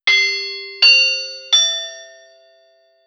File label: chimes